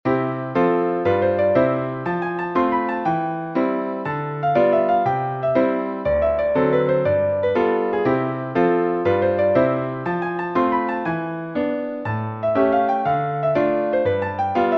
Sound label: piano, sheet music